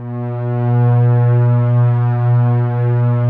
ATMO PADS; Best Service; XX Large

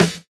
SNARE; E-Lab; X Static Goldmine 2; akai

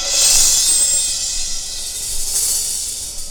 DRMROLL; E-Lab; X Static Goldmine 2; akai

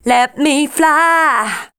voice spectral, ly short

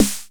909 SD

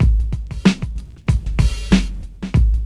LOOP, DRUM; E-Lab; X Static Goldmine 2; akai

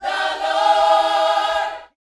YOUTH CH PHRASE, Vocal Planet Gospel; Spectrasonics; Vocal Planet Vol.1 Gospel

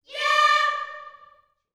"yeah", gospel vocal, D5; Spectrasonics; Vocal Planet Vol.1 Gospel; akai

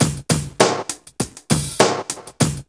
LOOP, DRUM; E-Lab; X Static Goldmine 2; akai

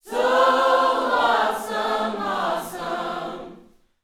Vocal Gospel PHRASE

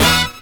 HORNHIT, HORN STAB

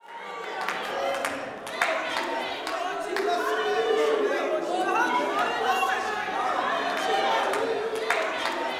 WORSHIP, Gospel; Spectrasonics; Vocal Planet Vol.1 Gospel

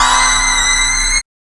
SYN FX

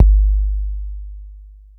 BD

